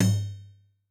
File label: Tine Drum; Yamaha DTXpress-II